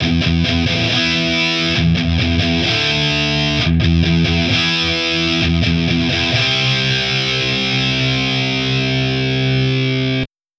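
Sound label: D112 stereo EQ, amp samples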